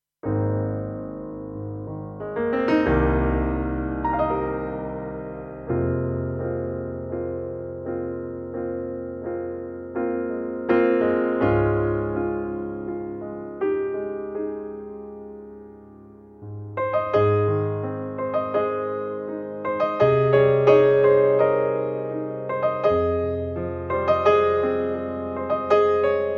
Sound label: Piano Version